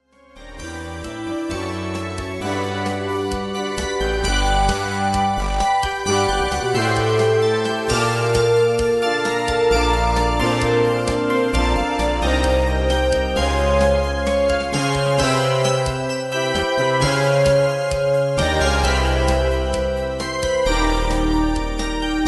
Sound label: Techno